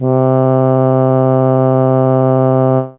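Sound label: Slow Trumpet 1 C4, Fairlight CMI IIe Brass; Brass2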